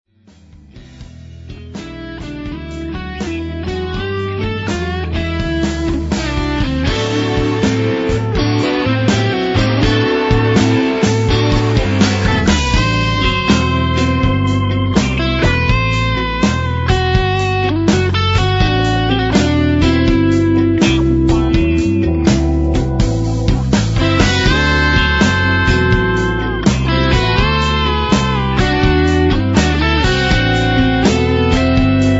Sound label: arpeggio; vic arpeggio; sleight of hand